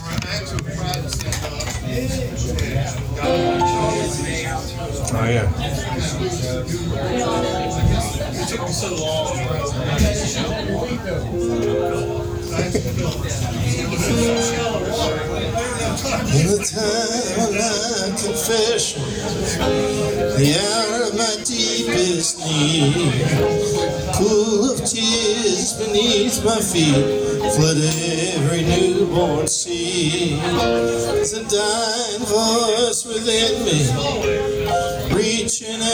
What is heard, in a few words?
on piano; live performances by zeb; fishers virginia beach va